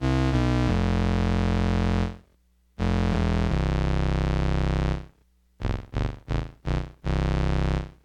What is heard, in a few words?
pss-140 pitch shift; dsimpson